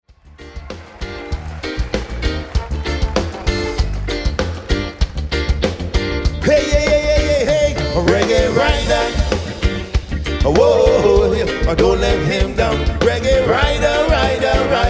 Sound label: Reggae